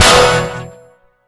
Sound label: gascan spawn; ui; source engine; left4dead2